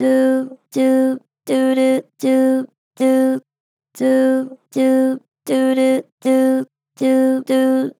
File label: Do Do, Vocals, Pitch Flat Gentle; Harmor Vocals